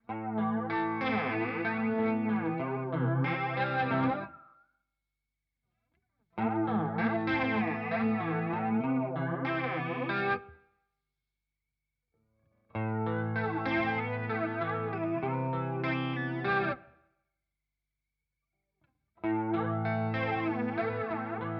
Walrus Audio Polychrome Sweep Regler

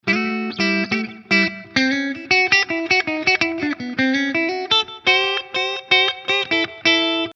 Mitte clean

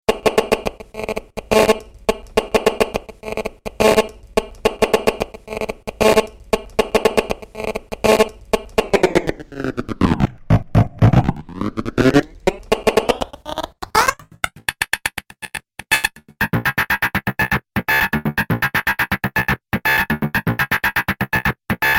Handy Einstreuungen Slice Loop

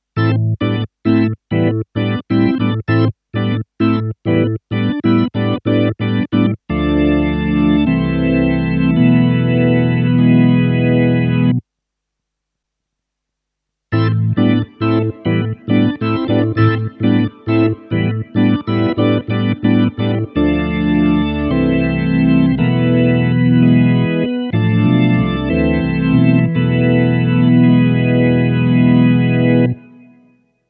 Strymon Big Sky Spring Orgel

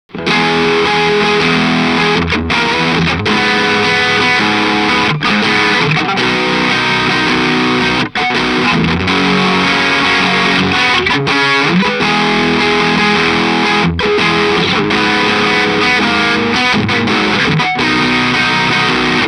Boss DS 1w Standard Tone 2 Uhr Dist max

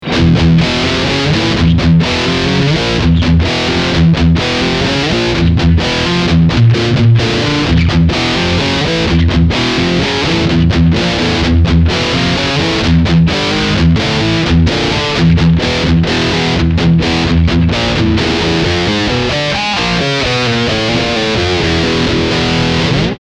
Feuer

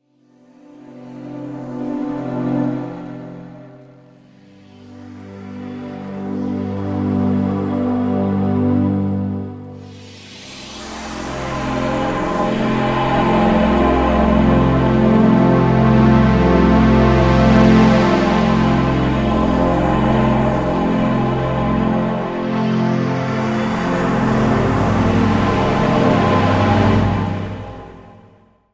Effekt Pad

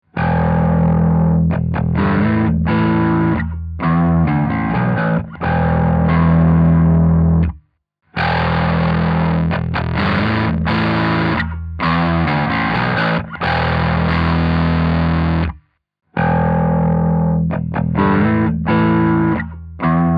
verzerrer

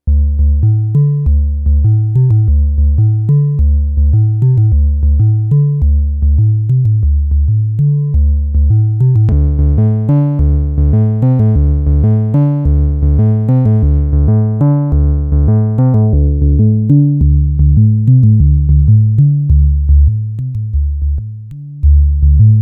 Wellenform Sinus Harmonic Sine mit Filter

